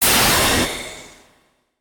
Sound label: distort